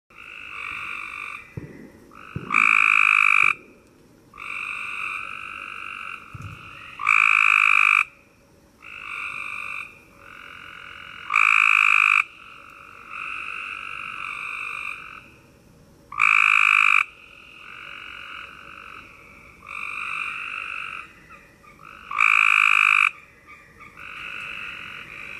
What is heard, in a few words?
Copes Gray Tree Frog Call